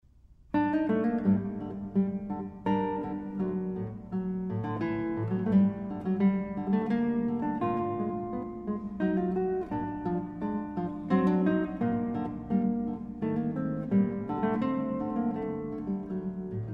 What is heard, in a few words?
Guitar Sonata in D major Tempo di Minuetto; Mario Castelnuovo-Tedesco